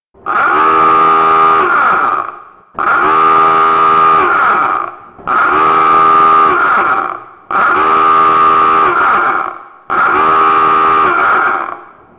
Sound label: Submarine Dive Alarm